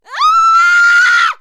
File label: Screams & Shouts human female; SoundBits